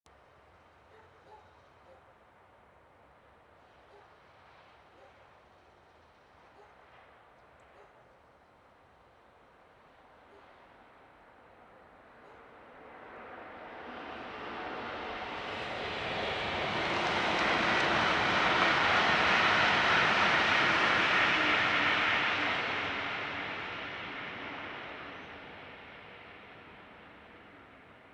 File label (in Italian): Treno mono; Eigenmike; A-weighted; Nuova Strada